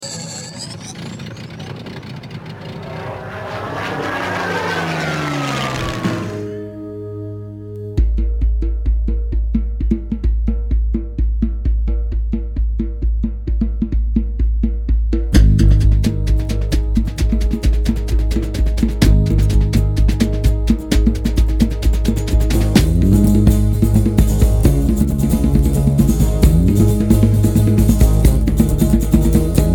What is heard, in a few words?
Taps